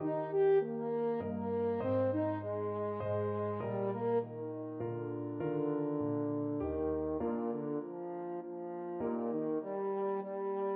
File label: minuet horn, french horn